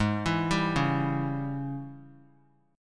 G sharp Major 7th Suspended 4th Sharp 5th; 20,23,22,23; JGuitar

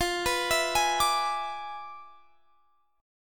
E 7th F, guitar; E7/F; arpeggiated

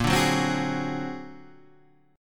A sharp Augmented 9th; A#+9 Chord {6 5 4 5 7 4} strummed

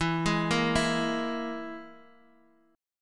E Major Flat 5th

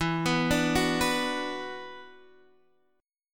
E 7th Suspended 2nd, down, Guitar, Open G

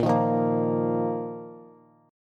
Bb Suspended 4th Bb down Bass; Bbsus4 strummed

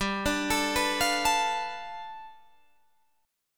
G 9th, Guitar Open D

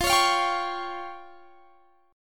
F Suspended 2nd Sharp 5th, chord; Fsus2#5; strummed; x,20,23,22,20,21

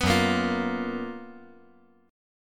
Gb Major 11th

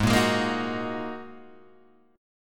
G sharp 7th Suspended 2nd G sharp Guitar Open G

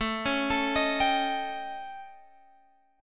A 7th Flat 5th Guitar Open G

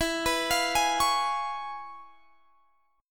C sharp 7th E; C#7/E; arpeggiated

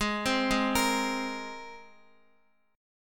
Ab Major Add 9th, Guitar; arpeggiated; x,x,8,5,8,6; down; 4F,4A,5C,5G,5C,6E

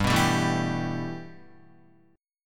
G 6th Add 9th G Guitar, chord; G6add9 strummed; 3,2,5,2,4,2

